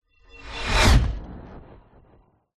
sound design cinematic whoosh impact futuristic sci fi; zapsplat